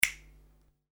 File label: finger flick